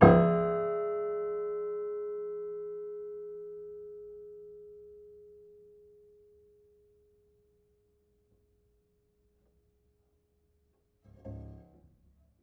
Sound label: Piano Harmonics, Harm Low Mid